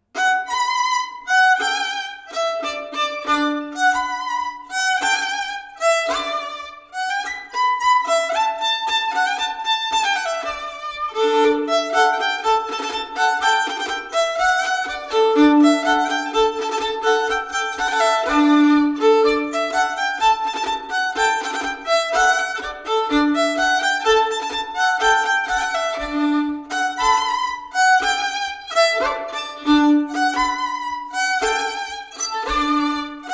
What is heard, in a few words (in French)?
Polka; Badoise ou polka des bebes